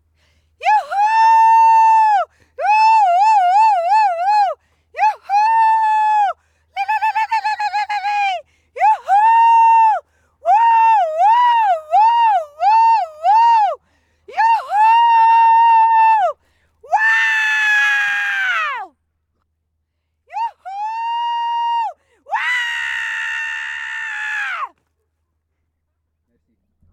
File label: Woman Wuhu Happy Shouting